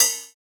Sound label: Cymbal, jungle breaks drum sounds; ORIGINAL JUNGLE BREAKS